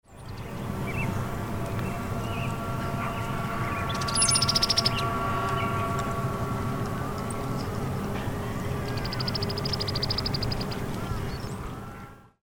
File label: Birds, Montana; Montana 2013